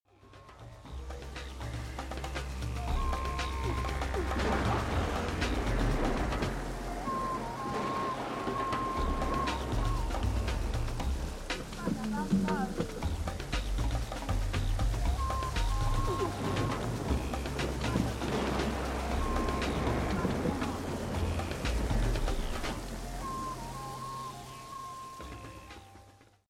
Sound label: thunder